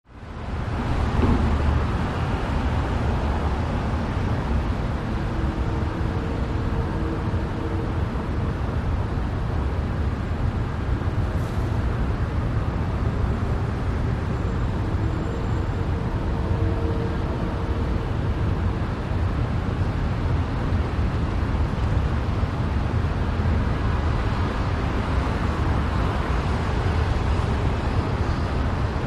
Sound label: Ambience: Steady City Traffic with Light Car Bys.